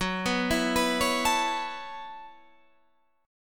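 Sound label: B 7th Suspended 4th G flat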